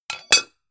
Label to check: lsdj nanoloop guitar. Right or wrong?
Wrong